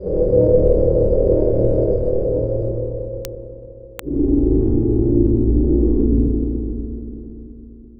Drone; Sonic Foundry (Sony Creative Software) - Future Retro Dance Excursions

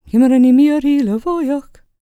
GAELIC, World Voices, GAELIC FEM

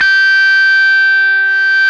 GTR, Dirty Buzz; Club-50 - Foundations Roland

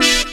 DR. LOOP; X-Static Goldmine 3; CHORDS

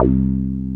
Synth Bass, Analog Bs

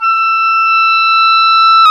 oboe, Roland Orchestral Winds; Oboe Brth; Oboe 1

